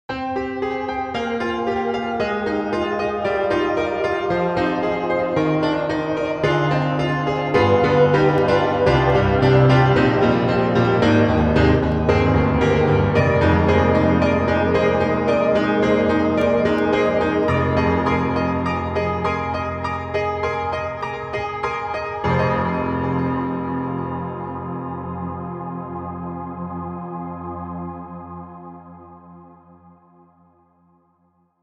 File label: Micropiano Distant Stack; Cakewalk; Rapture Expansion Pack 2; RGC Distant Stack 1